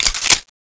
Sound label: g11 clip in, weapons